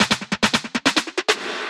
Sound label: Drum Fill 140 BPM; Drum Accents; Critic; Cymatics; GHOST (Beta Pack)